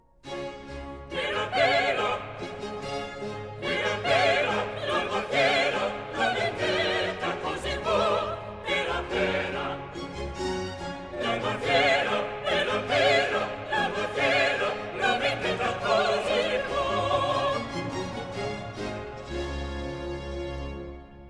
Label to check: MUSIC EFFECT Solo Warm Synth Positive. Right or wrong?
Wrong